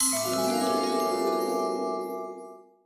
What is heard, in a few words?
Sting Wholetone